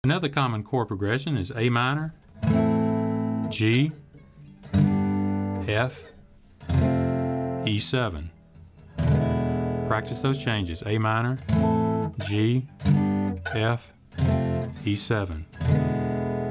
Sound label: Chords